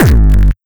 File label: Kick Dist Guitar Amp; Granular Sumo